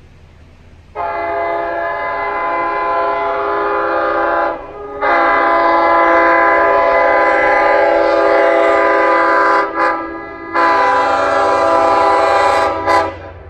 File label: approaching (K5LL); Glen Head June 2019; lirrhorns